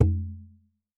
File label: Rebolo - F; percussion; Cymatics - Infinity Beta Pack 2.0; Zeus Percussion Collection